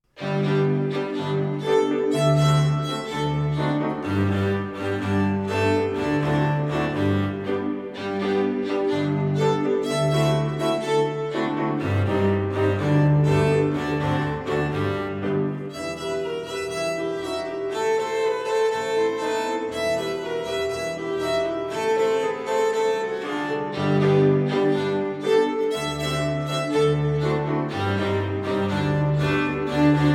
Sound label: Open String Tango; Everything String